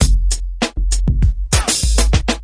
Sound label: low hip, drums